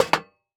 Foley Footstep Hard Sole Dress Shoe Single Step On Metal Platform; Foley Footsteps